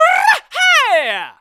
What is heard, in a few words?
Brrrrrah Haaa; Bomb-Shop Man: "Brrrrrah-haaa!"; zelda